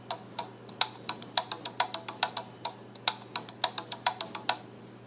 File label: timba ringtone; apple iphone 3g 4